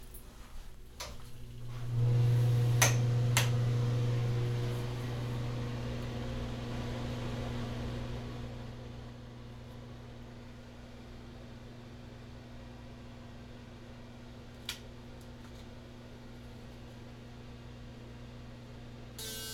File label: Electric Razor, binaural